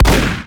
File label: Deagle